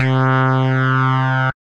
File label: Rez Lead, Synth Lead; Instruments; Hawkeye Rez Lead; Ableton; Synth Essentials; Operator